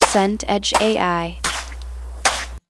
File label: footsteps wet pavement